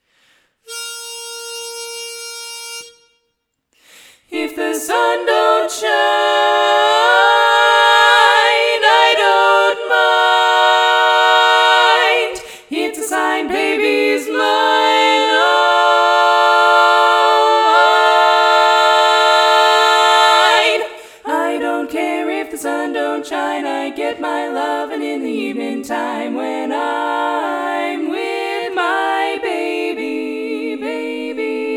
bass omit, chorus

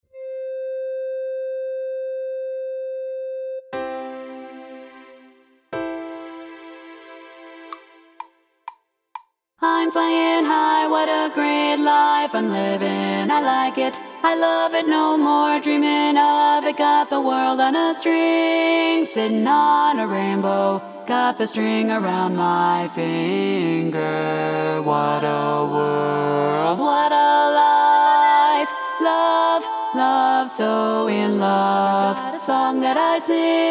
bass, chorus

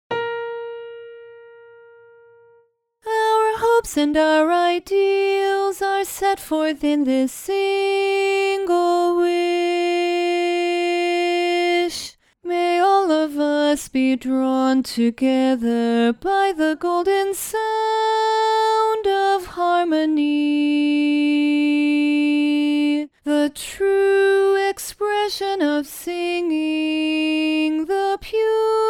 learning track lead alone

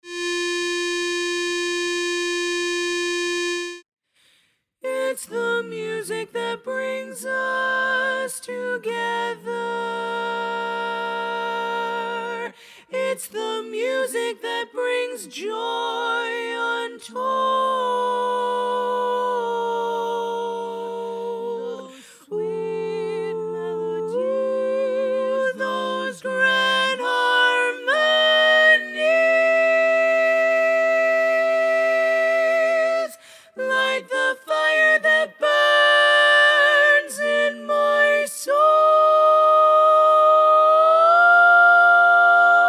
learning track tenor dominant

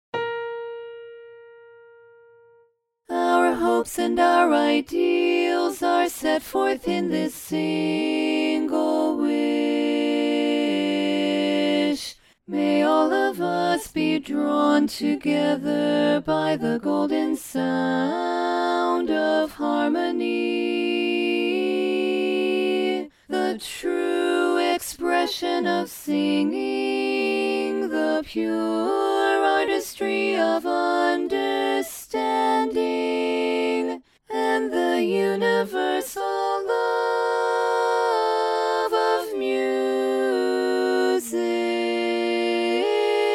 learning track bari dominant

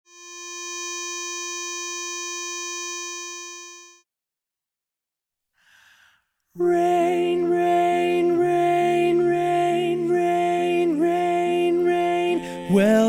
lead predominant

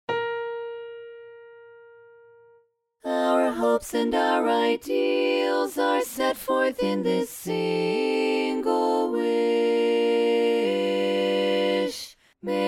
learning track tenor dominant

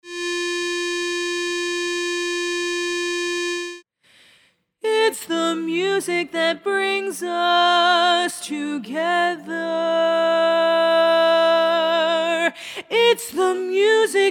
learning track lead dominant

